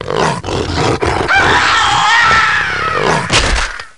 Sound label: growl scream, spooky sounds